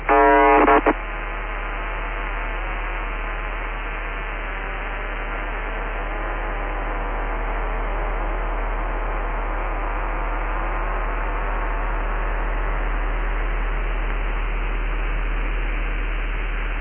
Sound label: usb failed msg